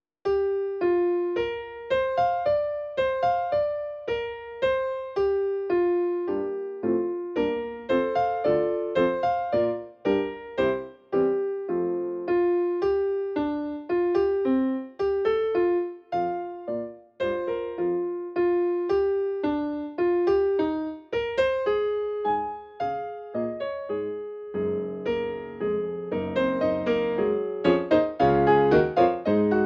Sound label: Steinway D